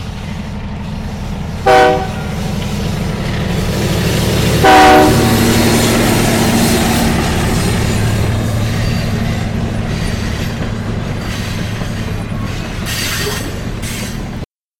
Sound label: Train Approach n Pass; Mike Koenig